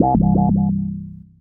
Menu Synth Tone; Menu FX

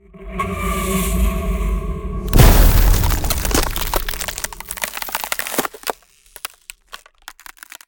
Ice Spell Barrier Defense Permafrost Aura; Arctic Aura

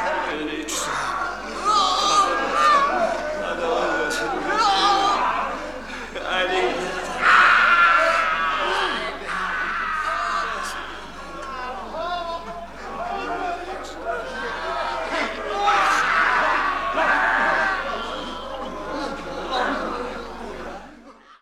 human noise battle; best service Blue Box; crowds interior

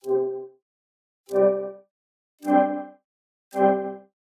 Digital Click Neutral

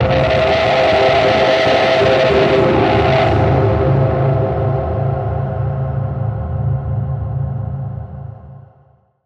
Grinding Wind; Cinematic Metal Impacts

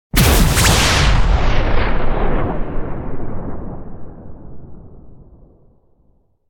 Explosion Sci-Fi Pipe Explosion; Hollywood Edge; sound designer's toolkit v2

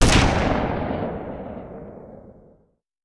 Rifle; Sound Ideas; Guns

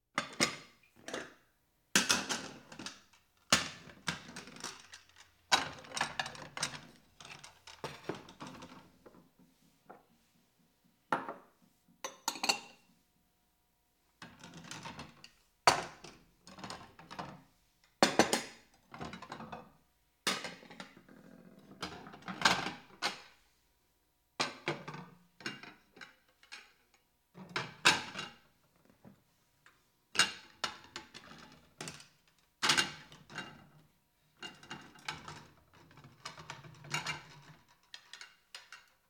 Household Phones

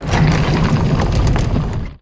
Scrape Bookcase Slide; Doors

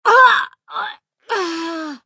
female pain death; firefight

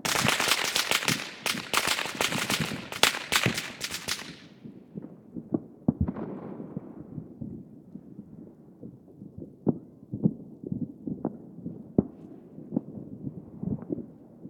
firework; best service Blue Box

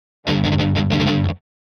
Guitar Fast Heavy Metal Finale Rhythm; Hollywood Edge; sound designer's toolkit v2

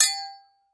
Sword Hit; Weapons; 1001 Sound Effects

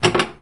Pinball Kickout Ball

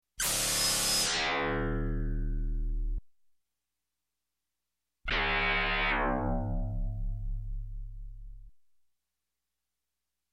Space Space Rock Stabs, Electronic, Music; Sound Ideas 2000 Series

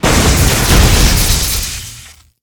Explo Large Generator; Destruction

